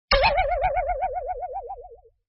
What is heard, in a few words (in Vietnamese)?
tiếng lắc lư